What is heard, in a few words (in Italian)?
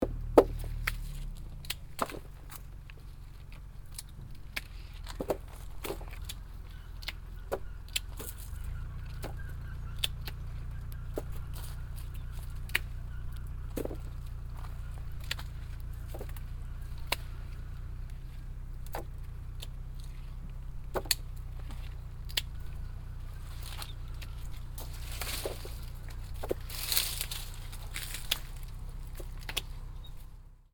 Taglio dei grappoli